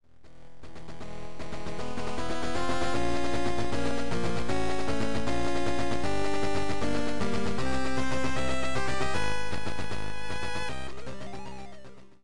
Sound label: intro in 8bit; batman the animated series